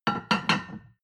plate on plate place soft; sport-freizeit; restaurant-cafe

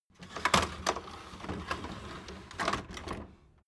door flap loft ladder retract

